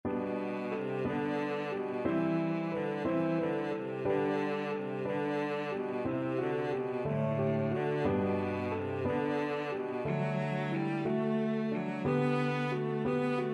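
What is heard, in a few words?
violoncello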